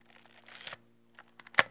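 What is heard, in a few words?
35mm Camera Manual Wind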